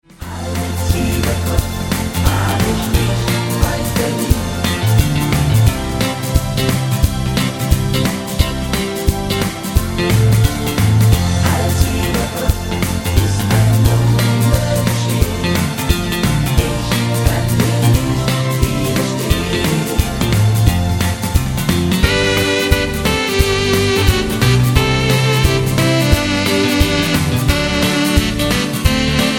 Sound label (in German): mit Chor